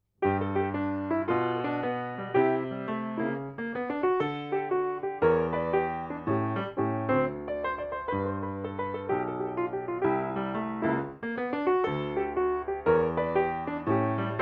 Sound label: jazz exercise